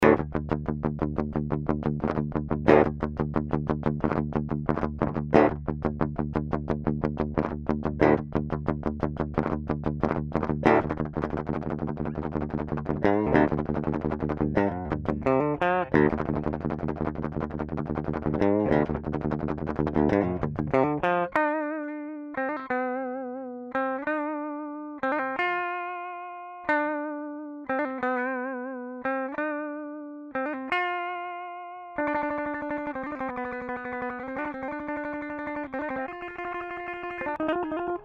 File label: Seymour Duncan Black Winter